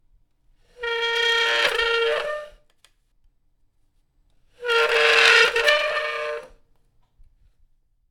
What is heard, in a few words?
Piano Seat Creaking